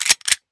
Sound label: glock draw